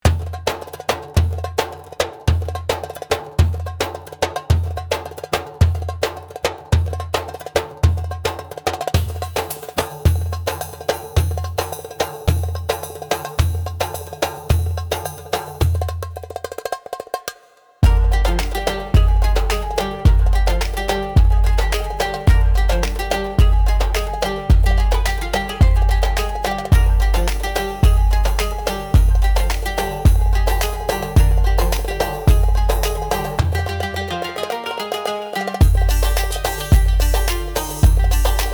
Middle eastern beat

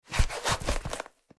Inflatable armbands putting on

